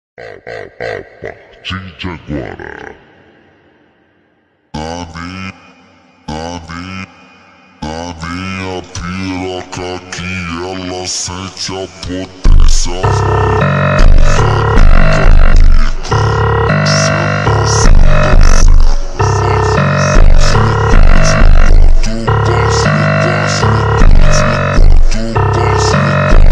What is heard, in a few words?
super slowed reverb